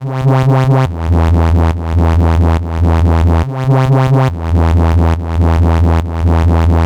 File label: 140 E BASSLINE, Dubstep